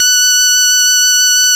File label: VOX F#5, VOX CONTINTL, Vintage Keyboards; Big Fish Audio; First Call Vintage Keyboards